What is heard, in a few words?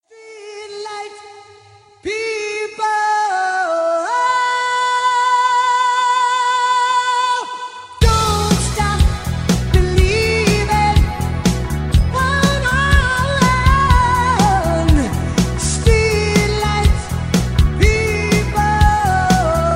Rock Mini Mix